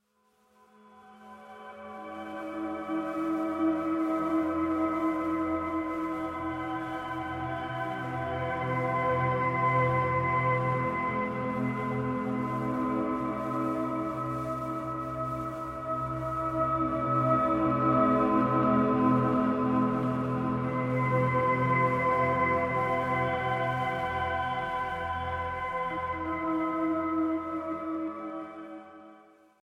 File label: background